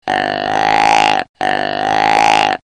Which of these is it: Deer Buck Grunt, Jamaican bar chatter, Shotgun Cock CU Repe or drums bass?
Deer Buck Grunt